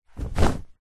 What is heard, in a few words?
household bedsheet flap sharp movement; zapsplat